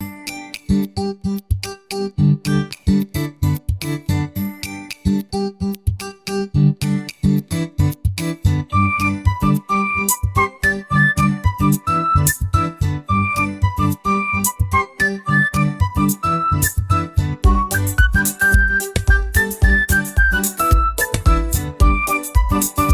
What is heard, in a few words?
morning bright